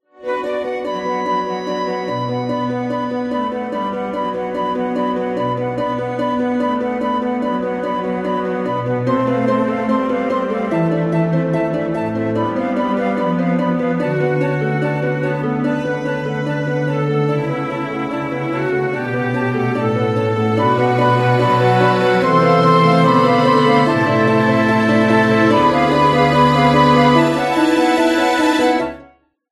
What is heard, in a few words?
concerto for electric guitar and orchestra